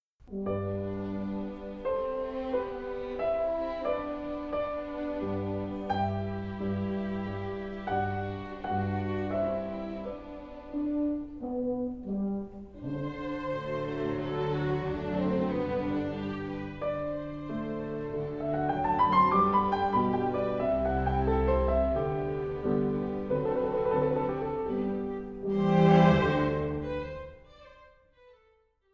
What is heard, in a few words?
Piano